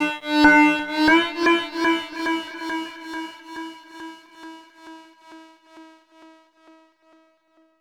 VCS3 FX, EMS VCS-3; Drums

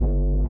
LIQUITAR; Spectrasonics; Metamorphosis

